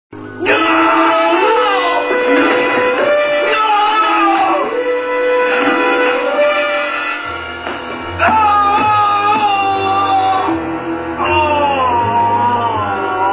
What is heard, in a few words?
arrrgh